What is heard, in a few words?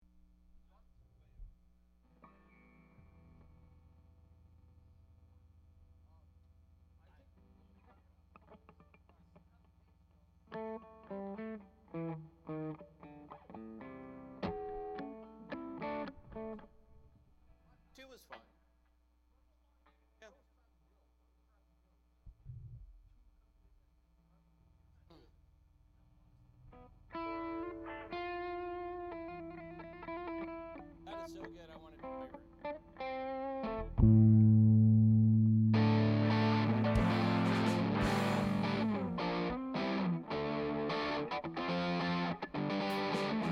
Strings Mix